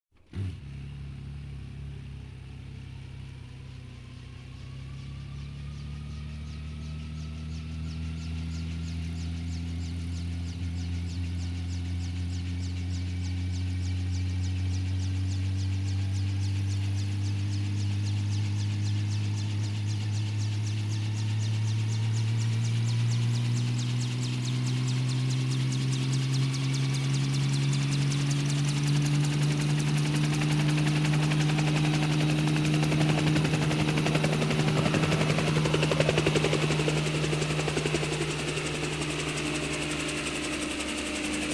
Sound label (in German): Hubschrauber startet und hebt ab; Luftwaffe